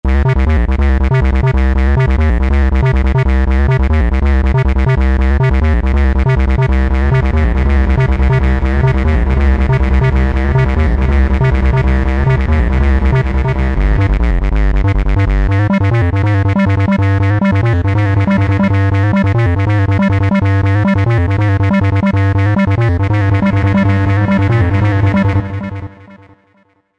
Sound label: Korg TR-Rack 303